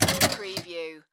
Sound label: Pinball sound, mechanical movements with slide